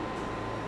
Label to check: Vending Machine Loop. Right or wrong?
Right